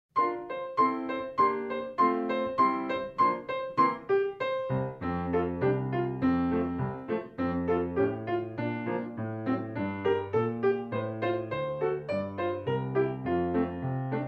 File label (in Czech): klavir housle; hudeb podklad